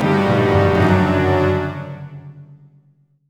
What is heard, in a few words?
Music Sting